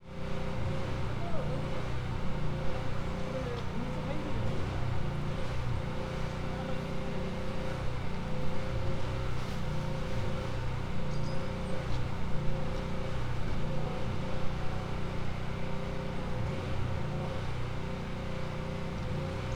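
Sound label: music bld, Fukushima University; 福島大学音楽棟前; 福島大学の除染作業は，この日も続いていた．; 音楽棟の前では，駐車していた車にビニールシートをかけ，木々を高圧水洗浄していた．; Koji Nagahata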